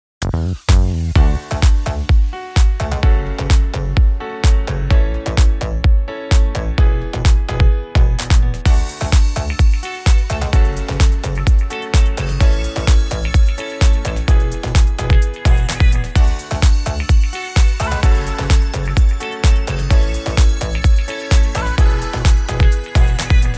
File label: Uplifting EDM Anthem